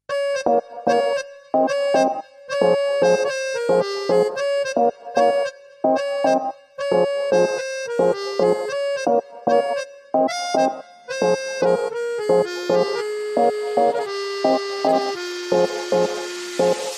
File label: Mello Tone